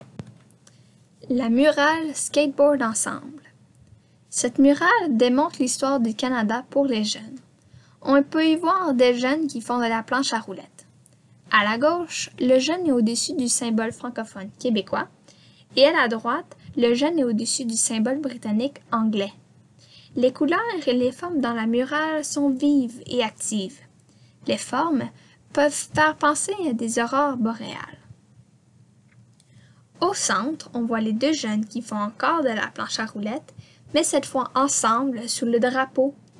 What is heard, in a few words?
Skateboard Ensemble